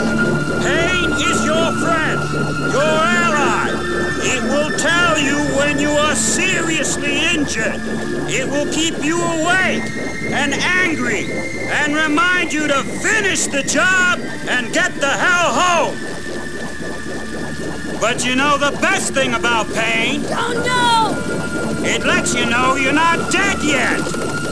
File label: pain